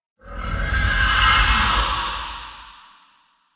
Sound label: Amb Wraith Night Screech; Ambience; Mendicant Area